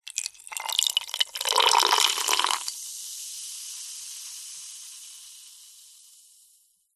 food drink soda cola small amount pour into glass ice fizz; zapsplat